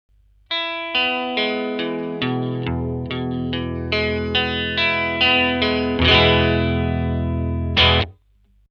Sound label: jag 1963 neck strangle